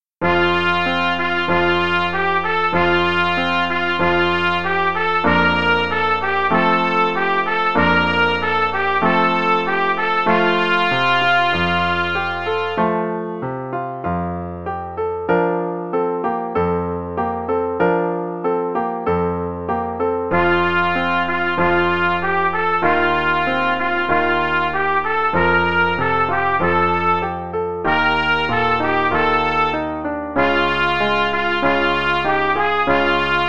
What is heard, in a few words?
Promenade (no click, qn=96) no dynamics; The Really Easy Trumpet Book